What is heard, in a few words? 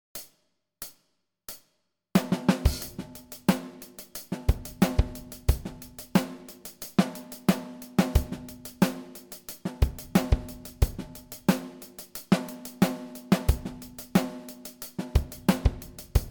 Intro 90bpm